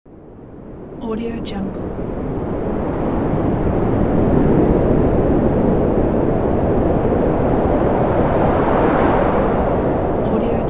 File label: wind in mountains